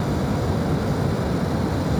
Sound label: unit move